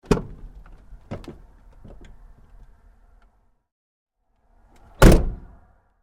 Truck GMC Savana, Door, Open-Close, Med; 2010 GMC Savana; Vehicles; Big Room Sound

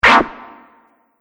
Arcade Game Warning Bleep; Video Game Sound Effects; Retro Inspired; Nintendo, Atari, C64

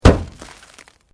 Metal Light Movement Minor; Materials

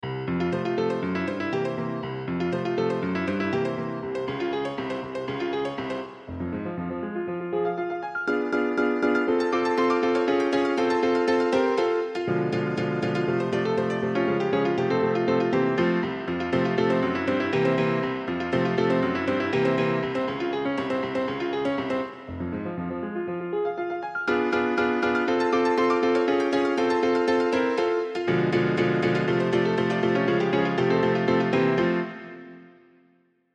Piano Maple Leaf, Piano Maple Leaf Rag; Pianos; Musical; Blastwave

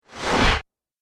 Laser Swish, whoosh; sound design

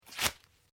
PAPER SHEET TEAR SHORT; Material components for constructing more complex sounds; Paper (Sound Effects:Materials)

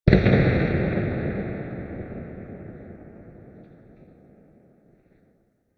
Huge Bright Explosion; Explosions; CraftedSignals

